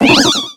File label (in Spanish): Grito de Mantyke